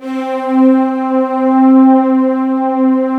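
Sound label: strings C4, mellow; MELOWSTR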